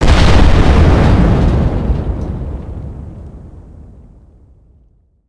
plane crash impact; World of Tanks Blitz; ambient neptune

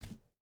footstep burlap wood walk; Rust

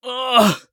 death; Escape from Tarkov

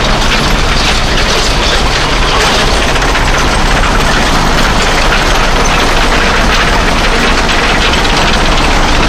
tracks heavy speed, tanks; War Thunder

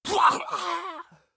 scav death, voice; Escape from Tarkov; scav1

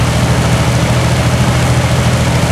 t90a engine rpm 66 load, engines tanks; War Thunder

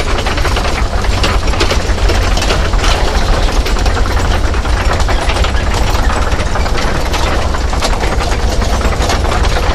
tracks heavy speed 33, engines tanks; War Thunder

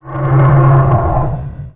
roar

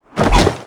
polycraft rip

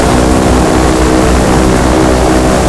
b17 side, engines; War Thunder

